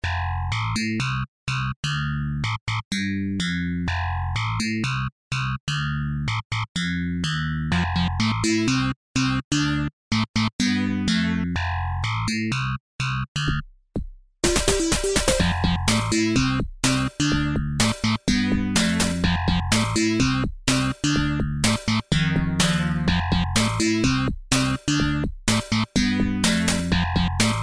fm thunder funk

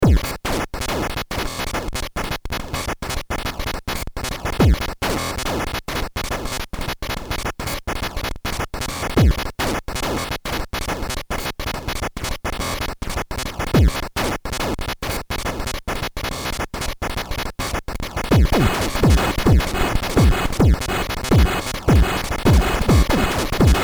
chiptune battle challenge

